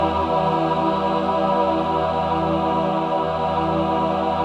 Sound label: min chord, Symphony of Voices